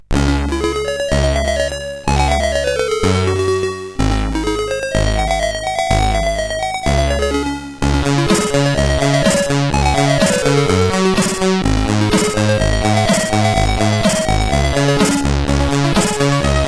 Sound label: Turkish Tune, CSG8580R5; The Dungeon Master